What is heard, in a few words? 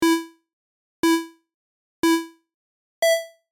countdown sound; tabatatimer